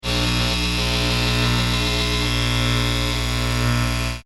Electric High Voltage, Very active electric buzzing and arcing; Electricity sounds; Electrical Sound Effects